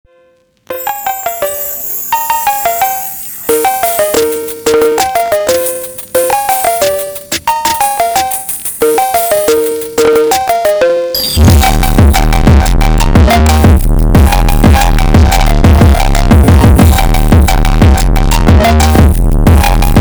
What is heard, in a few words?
Phonk Drift distorted Car Bass Music Remix